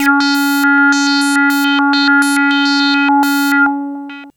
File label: Note, Synths, Arpeggios; Electronic Point Blank